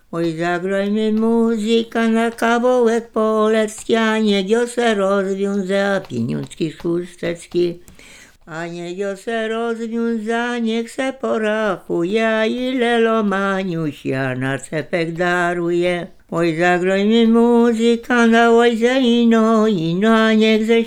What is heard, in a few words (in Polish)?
przyśpiewki oczepiny